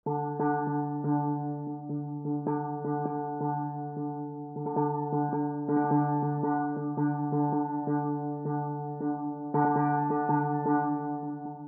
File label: Steeldrum; Steeldrums Life